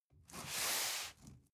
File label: cardboard box medium slide short soft; objekte